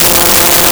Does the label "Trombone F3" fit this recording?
Yes